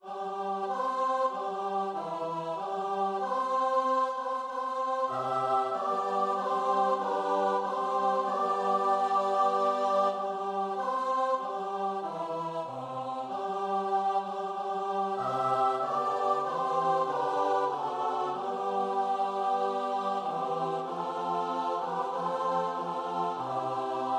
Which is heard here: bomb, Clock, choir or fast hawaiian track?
choir